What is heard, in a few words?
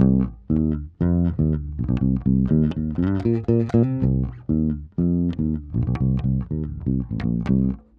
Bass 120bpm Cm, Nu Disco; Zero-G; Construction Kits; Legacy